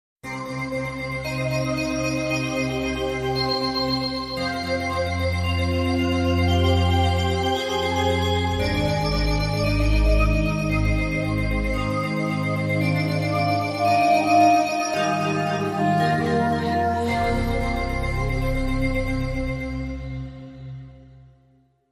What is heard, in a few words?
Bells In C Minor